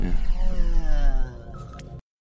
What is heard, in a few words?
Door Sigh